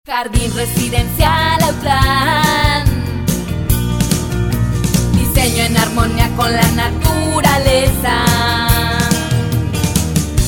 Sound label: jingles